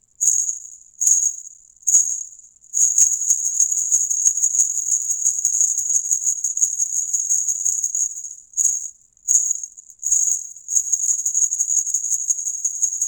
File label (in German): band mini frosch, schellen und glocken; Baender